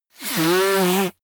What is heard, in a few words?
nose blow; comedy cartoon; koerpergeraeusche